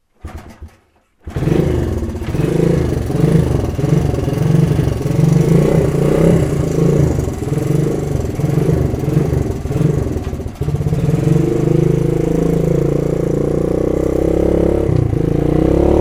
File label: Motorbike Ride; olofonia; Dr Dirt's